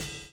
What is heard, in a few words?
PULL; Spectrasonics - Backbeat